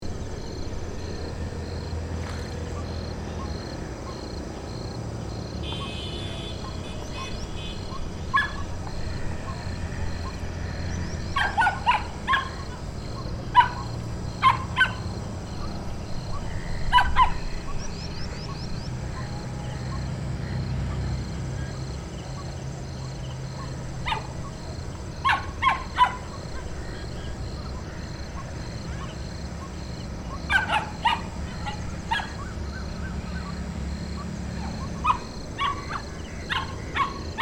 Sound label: Countryside farm birds ambience loop